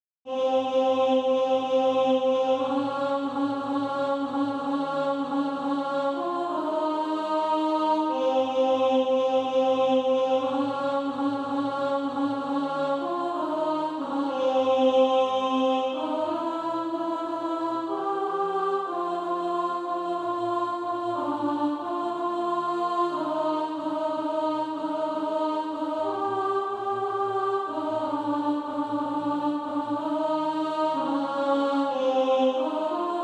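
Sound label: alto, Practise Music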